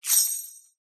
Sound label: foley keys large bunch prison guard throw jingle